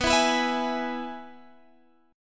Ab Minor Sharp 5th B

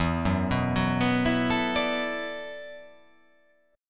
E 7th Suspended 2nd Suspended 4th, down

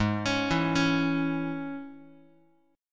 G sharp Suspended 4th Sharp 5th G sharp, Guitar; G#sus4#5; arpeggiated; JGuitar

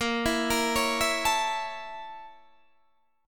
Bb Minor Major 7th Flat 5th, Guitar, Open D

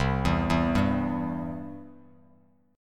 C Major, Bass Standard; arpeggiated; JGuitar

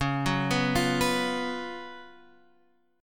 F sharp Major 7th Suspended 4th C sharp

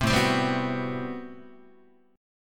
A Minor 13th A Guitar; Am13 strummed; 3,5,3,5,3,5; JGuitar

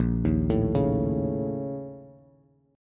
Bb Minor Major 7th Double Flat 5th, Bass; 6,6,7,6; arpeggiated; chord shape

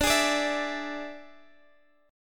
D Suspended 2nd Flat 5th; strummed

